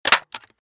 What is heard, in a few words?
mag place, weapons 9mmAR; halflife